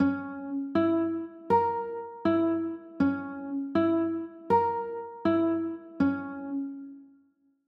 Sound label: b flat dim, chords